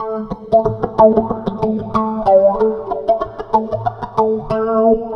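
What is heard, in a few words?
guitar, Roots Funk; USB Soundscan vol.41; AKAI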